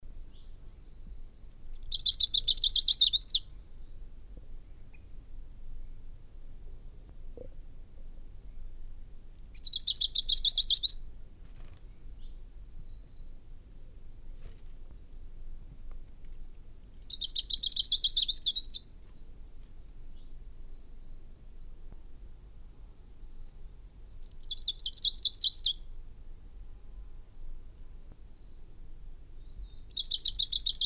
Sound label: lucys warbler